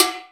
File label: can, Ticks, Misc Percussion